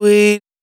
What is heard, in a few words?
Dry One Shot - G, Vocal; Tonal One Shots; Cymatics; Euphoria Vocal Sample Pack